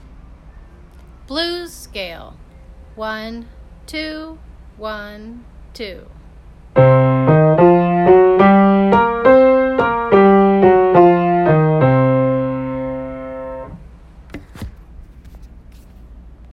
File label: blues scale